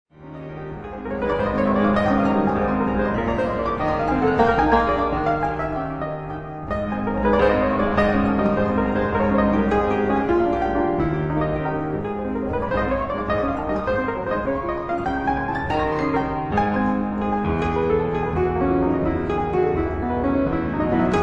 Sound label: Etudes for piano in Ab major